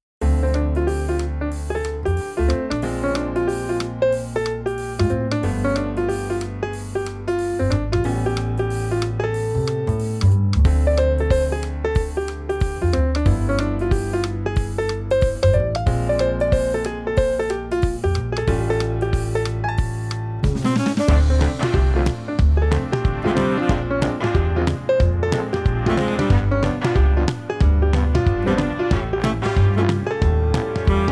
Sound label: upbeat jazz music restaurant hotel bar cafe backgorund intro theme